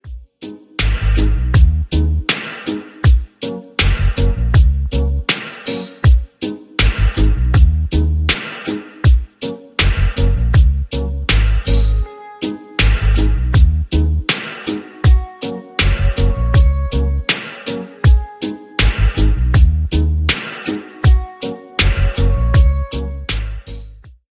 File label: dance reggae ringtone, english